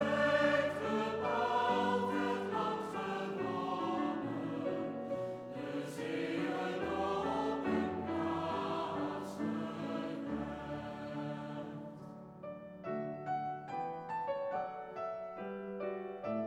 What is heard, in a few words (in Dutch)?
Koor gemengd; Amsterdamse studentenekklesia